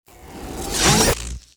Hit; Hit Of Penya; Fly For Insanity

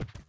move; Fly For Insanity

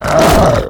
Vehicle Dmg; Nuctu; Fly For Insanity

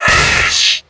Wheel dmg; Nutty; Fly For Insanity

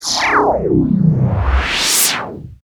Skill Cast Heal; FlyForInsanity

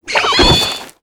Flybat Die; FlyForInsanity

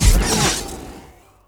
Piercing Arrow; Pc Skill; FlyForInsanity

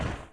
death; FlyForInsanity

